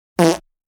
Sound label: fart